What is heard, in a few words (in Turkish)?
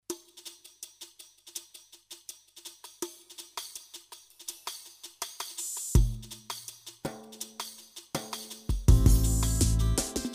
vahde, yamaha psr a300tr v2 ritm